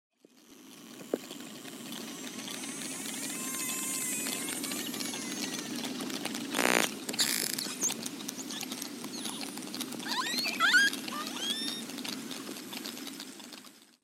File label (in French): Cachalot; baleines et dauphins